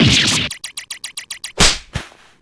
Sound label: damage; Herman